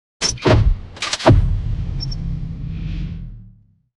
transformers style car, transformations

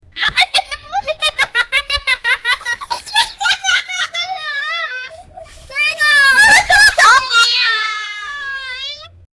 ha ha ha ha kids

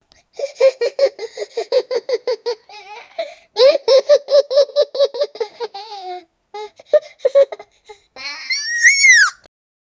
child laugh; happy

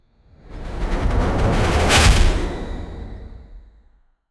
magical portal opening with a whoosh

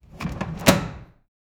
reach stacker container, containers

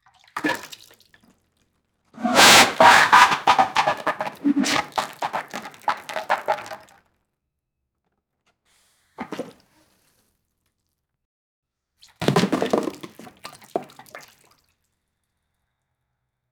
explosive diarrhea bucket

